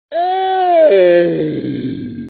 OOOHHH

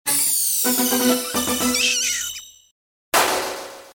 gameover

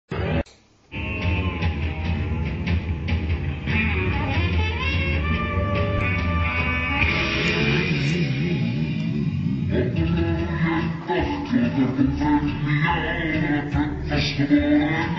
chant; catdog

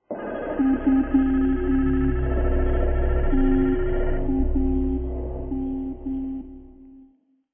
scan; vapesquared

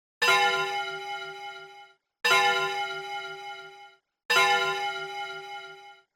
clocktower

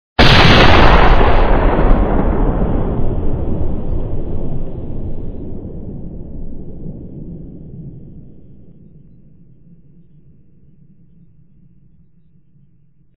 gun; vapesquared

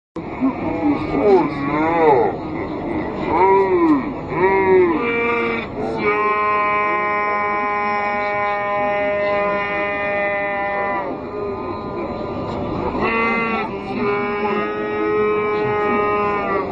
pizz

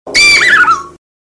goofy yell

